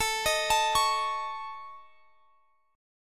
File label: C sharp Suspended 2nd Sharp 5th A